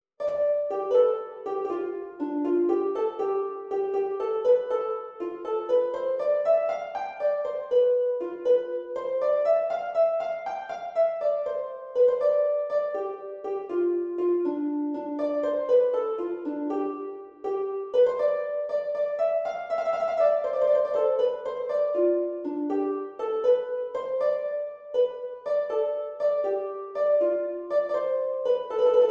harp

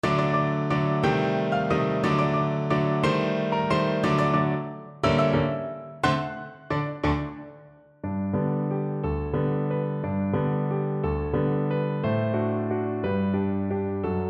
waltz piano; merry widow

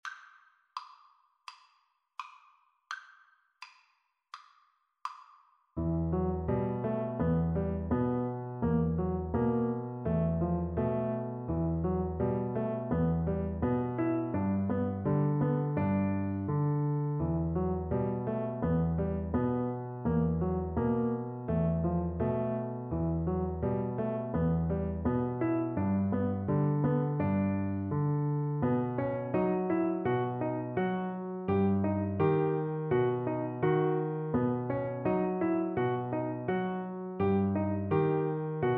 mountain king, Double Bass

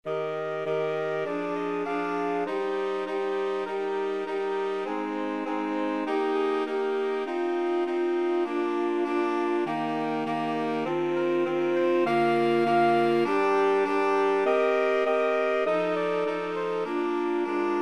land of hope, saxophone quartet

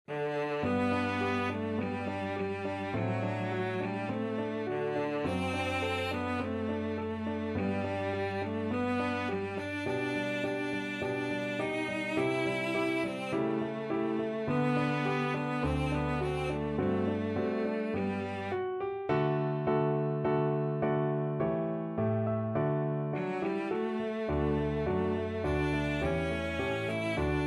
zartliche liebe, cello

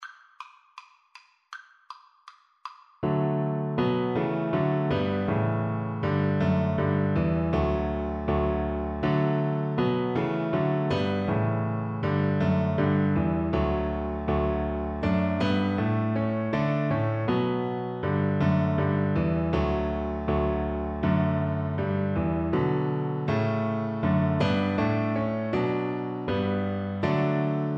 good king, Double Bass

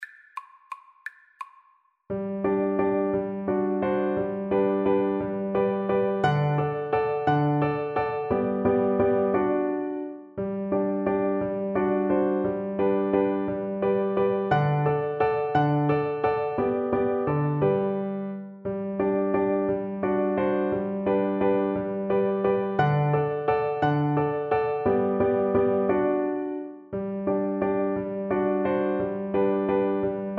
german dance, Recorder

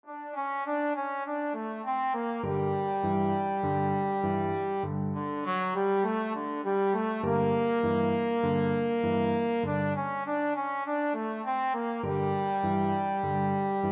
boogie, trombone

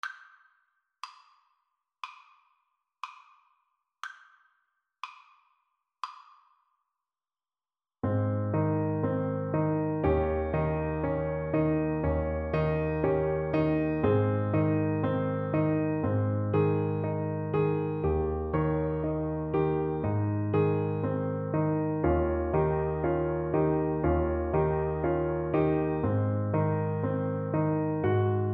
French Horn

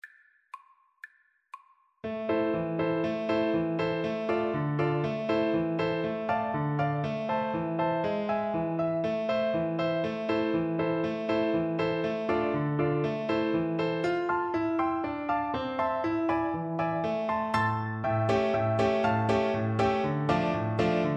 Alto Recorder

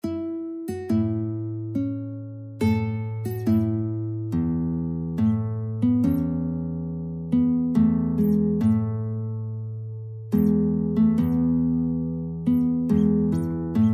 rock of ages guitar

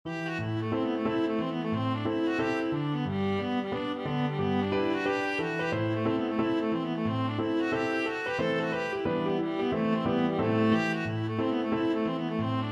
viola

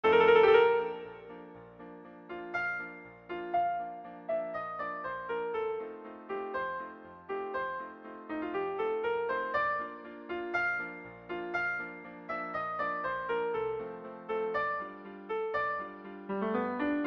trish, trumpet

